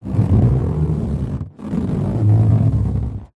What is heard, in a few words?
balloon inflated rub muffled; zapsplat